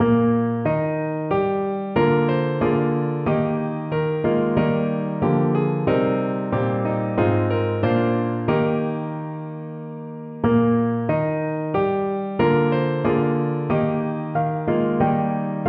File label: Descant Piano only; With Songs of Praise Hymn 71